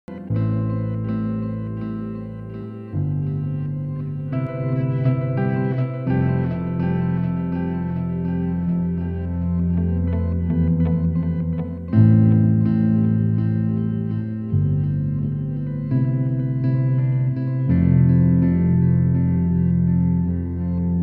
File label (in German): Golden Master Gitarre Compressor Settings; Endorphines